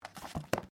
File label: Book Close; Book movement; Offices